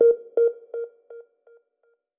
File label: Dashboard + Internal Message Ding